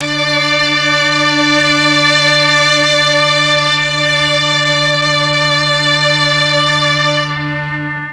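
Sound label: D3 MIXED STRINGS, Mellotron; Mike Pinder - Mellotron Archive CD; MIXED STRGS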